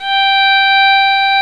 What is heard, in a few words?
fiddle, string instruments; Sound Effects for Multimedia - Volume 1